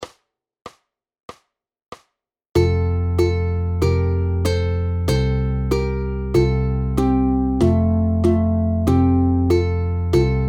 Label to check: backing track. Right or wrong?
Right